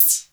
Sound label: ANIMAL HAT, PLASTIC DRUM; Crypton - DrumBase5000